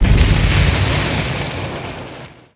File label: straightfire